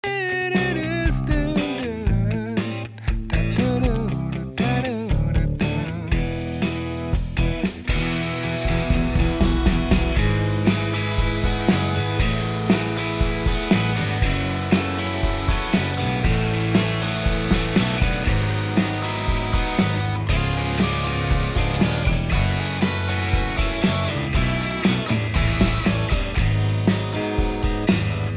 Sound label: lead